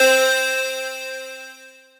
Casio Funk; Retro Synths; Ableton; Factory Packs; Vector; Vector Patches

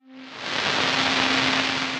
Noise Rain; Effects; Ableton; Skitter and Step

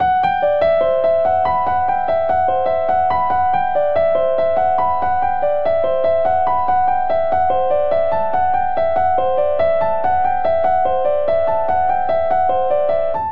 Ostinato Modal C Lydian 72 bpm, Grand Piano; MIDI Clips; Ableton

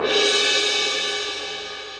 Suspended Cymbal Mallet Hits; Ableton; Orchestral Mallets

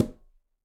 Tumba B Heel, Conga; Latin Percussion; Drums; Drum Hits; Ableton; Factory Packs

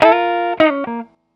101 Pop Guitar Fill Db; Pop Rock; Electric

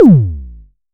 modular pitched; Ableton Foundation FreePacks; Wicked Kits

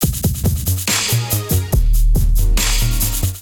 groove 140 bpm; MIDI Clips; Ableton; Factory Packs; Beat Tools

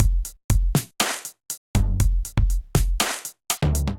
Kit, Drums Hybrid; Chicago Kit; Ableton; Factory Packs; Drum Essentials

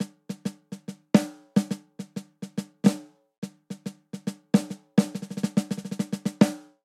Swing Jazz Snare Only 140 bpm; MIDI Clips; Ableton; Factory Packs; Drum Booth

